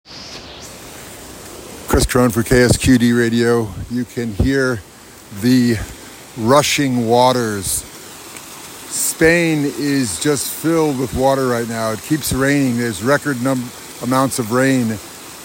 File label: walking in rain; El Camino